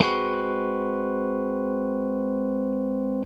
FUNK CHOR, Funk Gtr & Wah; PlatinumPhattCD2; E-MU Producer Series Vol. 8 – Platinum Phatt

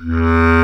BASS CLAR E1, WIND

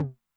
HI TOM, Med Tune Short Decay, 909; classic machines; HT - HI TOM

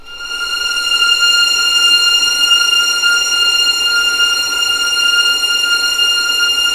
F6 LEG FVL R, String; East West; The Ultimate String Collection

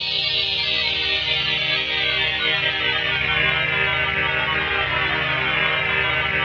PHASE PAD

